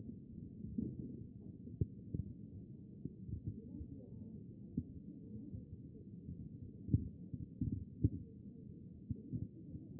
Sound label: A CHEST; B MODE